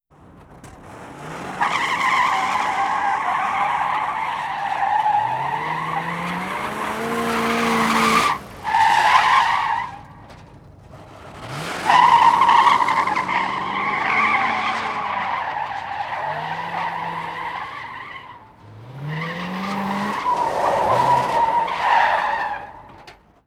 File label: Skid And Peel Away, Cars & Trucks; Sony Pictures Sound Effects Series